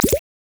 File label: bubbles